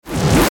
Distortion Sweep Pan; Sweeper; Blastwave

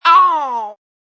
Vocal Funk Ow

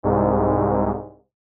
Error Buzz; Electrical Button; Multimedia; Hit, Electrical, Burst, High, Production Element, Imaging Element, Accent, Transition; Electrical Buttons; Blastwave

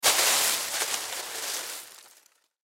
Rake Leaves, Rake Leaves Movement Foliage; Yard Tools; Household; Blastwave

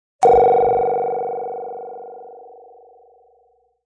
Ringer; Rings

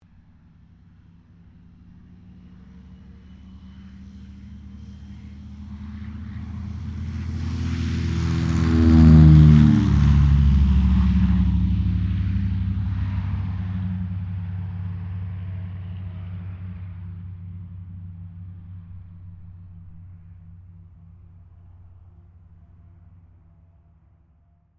AIRCRAFT CA-13 BOOMERANG TAKEOFF; Airplane Sound Effects; Airplanes (Sound Effects:Vehicles)

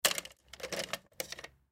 Pen Drop Into Cup Container Pencil; Office; Blastwave

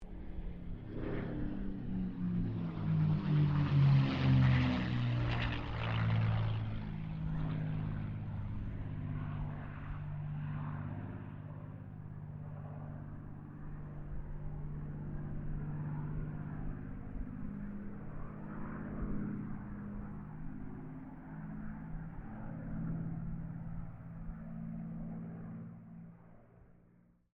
AIRCRAFT CA-18 MUSTANG PASS DISTANT; P51 /CAC-18 Mustang; Airplanes; Vehicles; Aircraft Collection; Twistedtracks

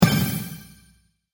Magic Power Impact

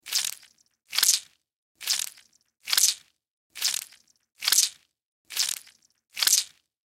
Footsteps Squish, Footsteps Squish Wet Mud; Cartoon

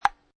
Ping Pong Table Tennis Bat Hit Ball; Lynne Music

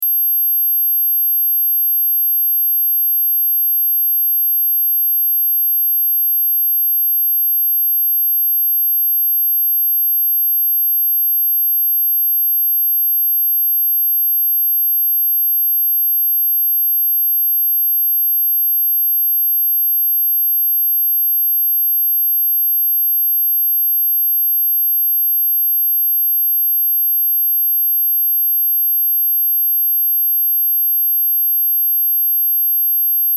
Test Tone Sine; Test Tone Sine Wave 12.5Khz 60 Sec -12Db Loop

